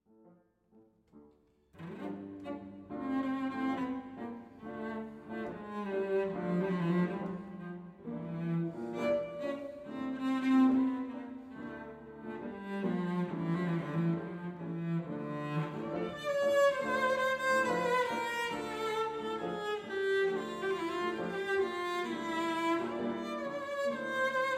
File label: Cello Piano